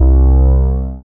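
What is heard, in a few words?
synth analog; Best Service Gigapack 1; SYN 02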